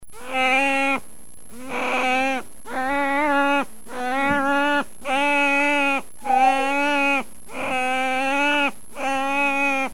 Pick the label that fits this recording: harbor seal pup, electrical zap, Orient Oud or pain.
harbor seal pup